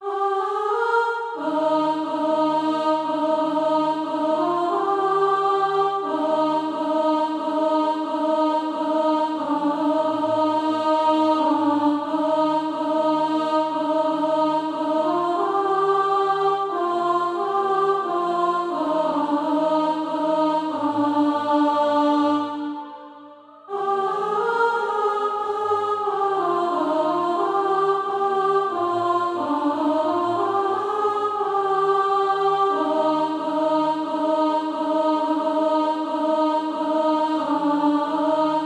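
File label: alto, SATB Practise Music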